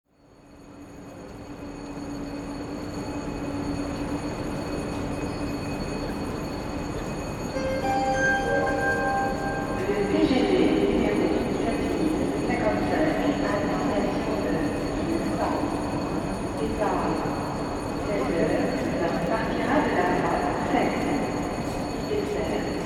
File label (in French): portee ferroviaire bonne ambiance